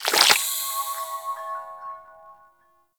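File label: Power Shroom Projectile Pulse; Warframe; Nokko; Empowering Mushroom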